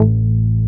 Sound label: SHAKY A1, BASSES